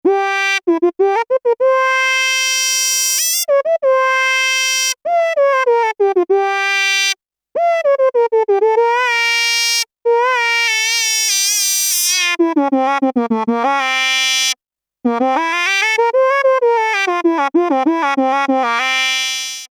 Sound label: Flute